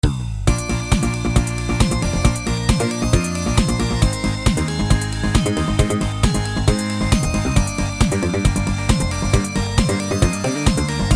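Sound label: FM synth music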